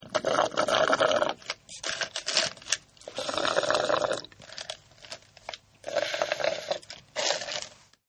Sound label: Drinking Thu Straw; Human; Partners In Rhyme